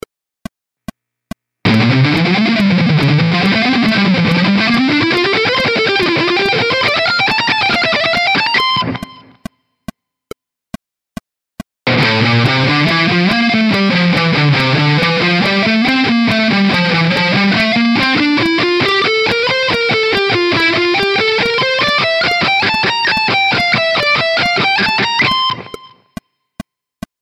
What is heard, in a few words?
ascending shred, guitar